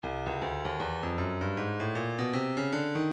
breakbeat midi files 075 079 bpm; Slithery E; Stylus Breakbeat Midi Files

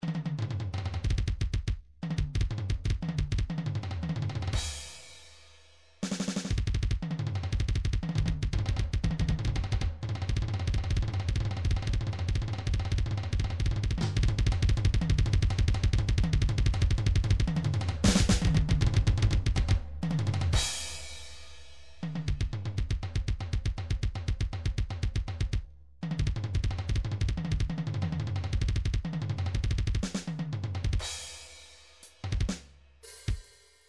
midi drums percussion fill; midi vol 4 drums percussion

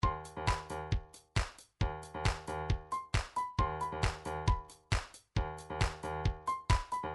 trance midi loops beats sfx water; Newtronic Trance Constuction Kit

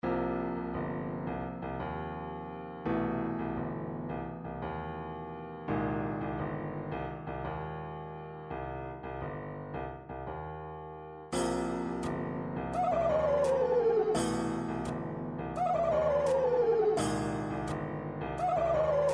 triphop; Newtronic Triphop Construction Kit

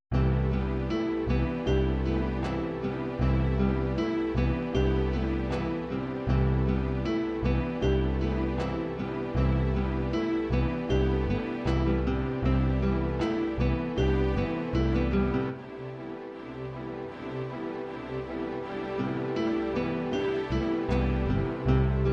ballads ballad pop ballad, midi

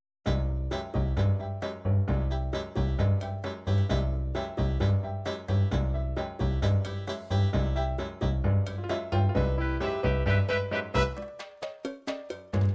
cha cha cha cha

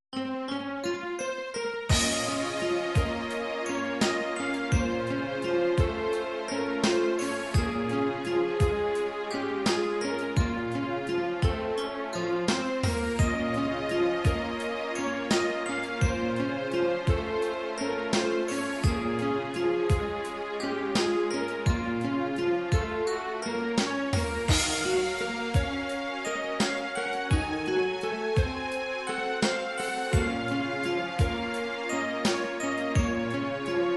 Roland sc 8850; super nintendo; sequenced; final fantasy mystic quest; level forest

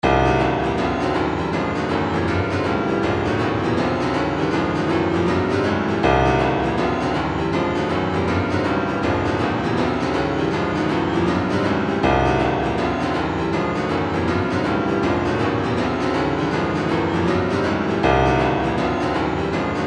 retro funk 080, midi; dukey

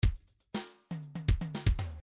midi grooves funk

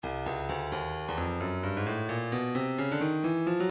stylus breakbeat midi 131 bpm

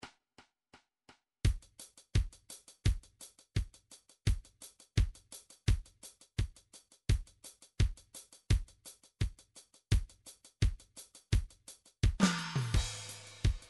softrock

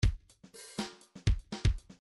bdf midi file grooves funk a funk a

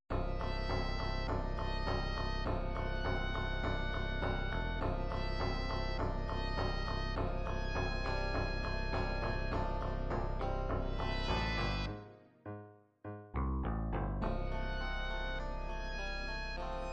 ballads ballad rock ballad, midi

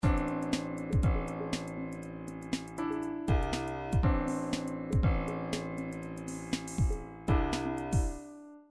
triphop; Newtronic Triphop Construction Kit

